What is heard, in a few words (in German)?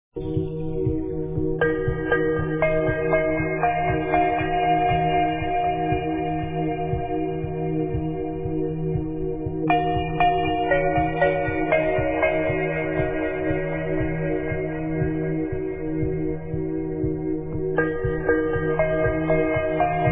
Das Glockenspiel